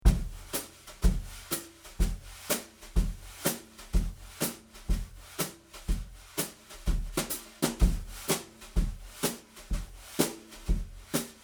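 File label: Brushes EAD Overhead, snare drum; Yamaha EAD10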